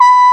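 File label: CORNET C5; AKAI S-Series CD-ROM Sound Library VOL-2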